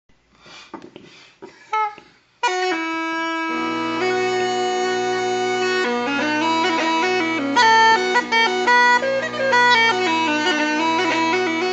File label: drones, uilleann; gareth barrys; dermot donnelly